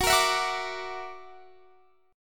Gb Augmented 7th Gb down Guitar Standard; Gb+7 strummed

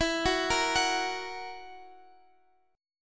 E Suspended 2nd Flat 5th E

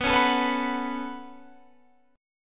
B 7th Suspended 2nd, Guitar, Standard; B7sus2; strummed; JGuitar

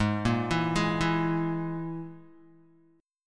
Ab Suspended 2nd Sharp 5th, down, Guitar; arpeggiated; 4E,4A,5D,5G,5C,6F; JGuitar

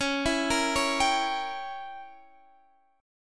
C sharp Diminished 7th C sharp Guitar Standard; arpeggiated; chord; JGuitar

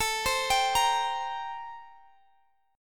A-Minor 7th Flat 9th, chord

